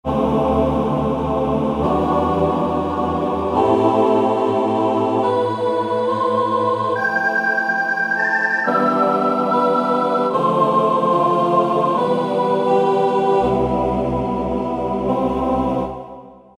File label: Choir Aahs, Roland SC-50 Sound Canvas; Factory sounds